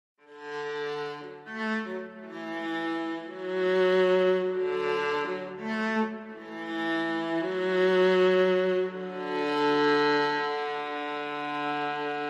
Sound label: High Strings Only